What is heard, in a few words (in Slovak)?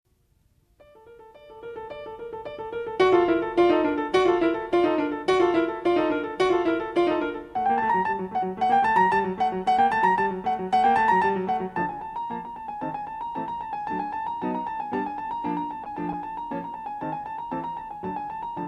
Mala suita pre klavir; Zeljenka